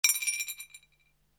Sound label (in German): mikro nahe kugel teller